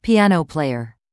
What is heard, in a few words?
piano player; UNIT 7: JOBS (NGHỀ NGHIỆP)